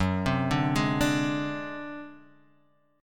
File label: A flat 7th Flat 5th G flat, chord